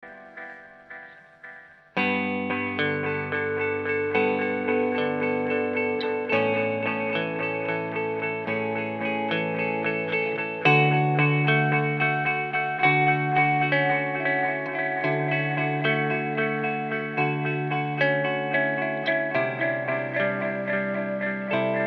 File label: delay amp